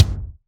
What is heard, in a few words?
Dnb kick